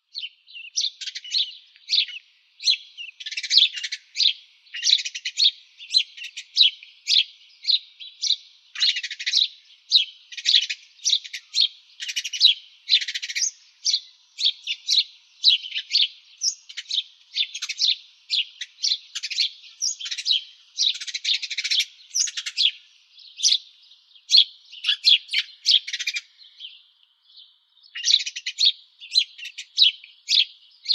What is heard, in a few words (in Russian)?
vorobei domovii passer domesticus, zvuki prirodi